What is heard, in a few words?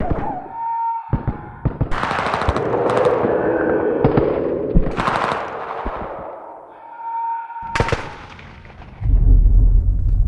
distant fighting